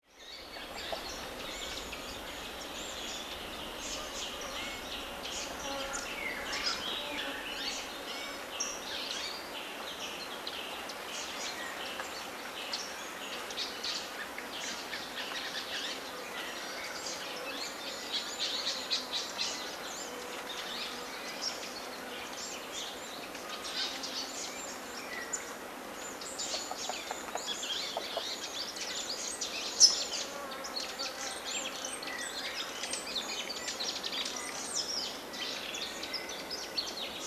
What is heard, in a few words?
Peaceful forest ambience